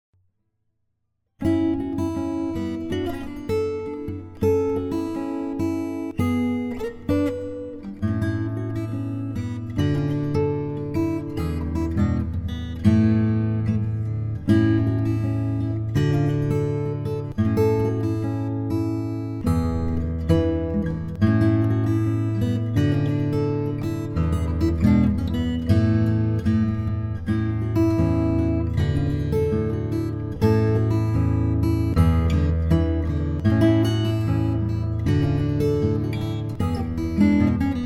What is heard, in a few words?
african tune